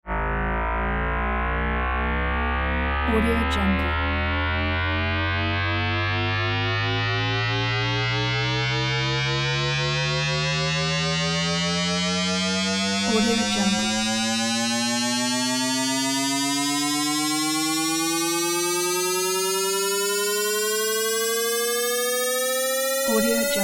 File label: rising tone buzzy hum ascending in pitch raspy